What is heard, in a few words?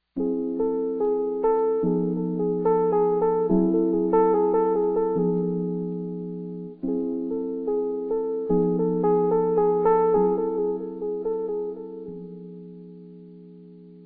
4 Trombones, Christmas Carols; Martin Schmid Blechbläsernoten; Vol. 2 Christmas Carols